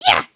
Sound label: headbang